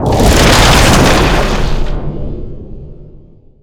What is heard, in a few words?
overgrowth cast; treant